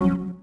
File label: cancel